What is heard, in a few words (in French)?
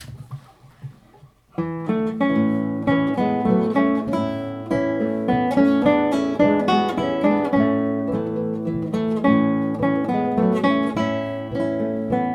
mazurka irlandaise